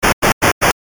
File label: stairs; maoudamashii